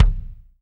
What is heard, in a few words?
BD, DR BD; Best Service Gigapack 2